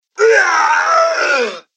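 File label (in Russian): призрак одиночный резкий мужской крик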